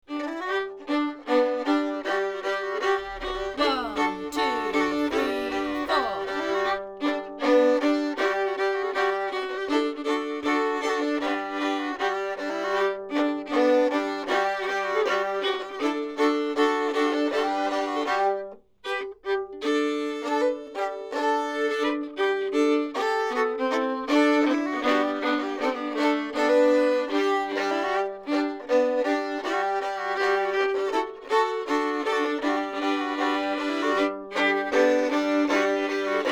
Basket Coal Dust, Backing

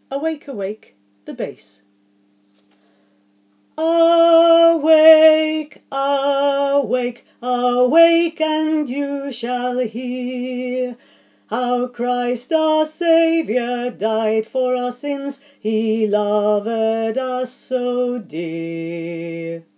Awake Awake bass

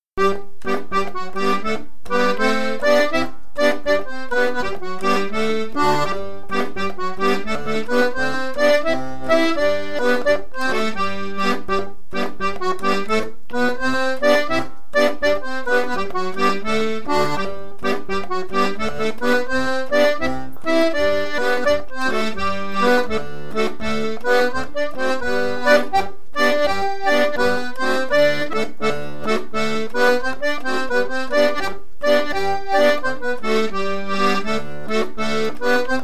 Month Of May jig slow

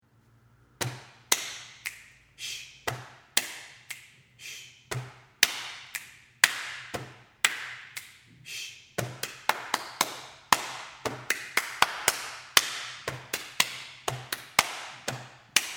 Body Percussion

